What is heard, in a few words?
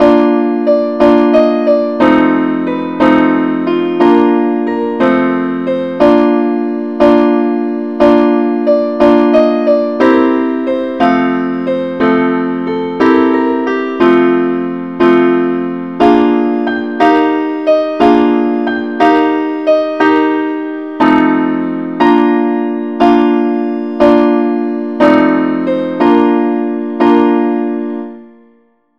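simple acc key B flat; angelvoices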